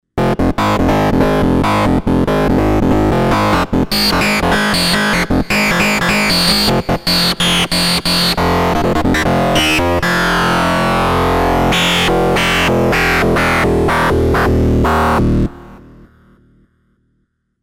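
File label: Bass Hard sequence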